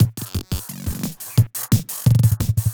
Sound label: Glitched Beat 175BPM; glitch kit